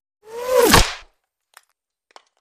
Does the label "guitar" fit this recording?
No